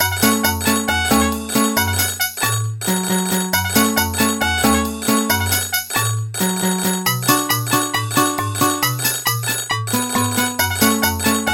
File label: Nokia Oompah